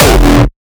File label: kick oneshot monster E; GhostHack